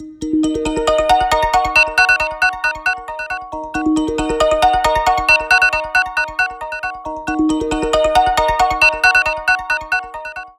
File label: Intense, alarms; Vivo; X200-Pro